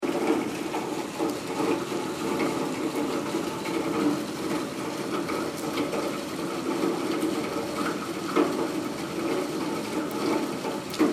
Rain Gutter Alley Heavy Flow Loop, Heavy rain with water flow; Seamlessly looping sound.; Rain Sound Effects